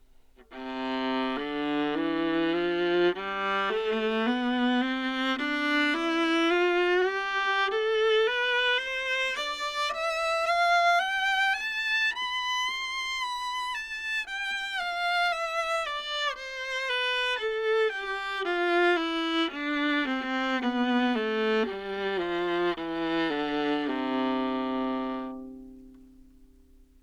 modern viola c major slurred, Anechoic; OPENAIR

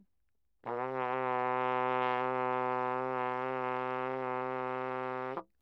natural baroque trumpet low, Anechoic; OPENAIR

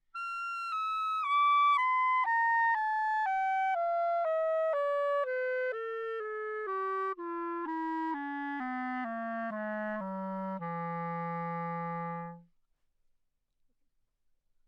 classical clarinet c, anechoic; OPENAIR

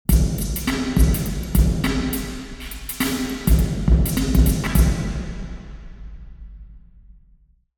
far drum, church; St Georges Episcopal

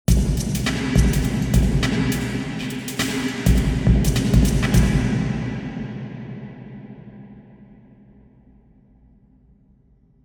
sports centre drum; cardioid; university york

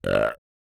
burp; OPENAIR; Anechoic

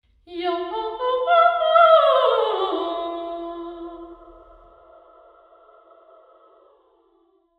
tennis court omni sing; falkland palace royal tennis court

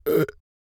burp; OPENAIR; Anechoic

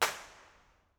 boathouse, creswell crags; b-format

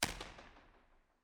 falkland tennis court; falkland palace royal tennis court; surround 5.1

